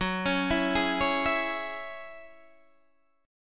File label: Gb 7th Suspended 2nd Gb Guitar Open D